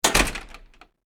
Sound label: Door Hotel Door Close; Foley; Miscellaneous Doors